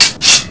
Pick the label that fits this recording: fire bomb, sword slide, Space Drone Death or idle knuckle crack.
sword slide